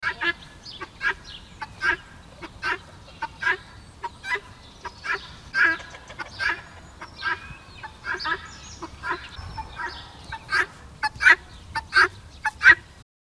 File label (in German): Perlhuhn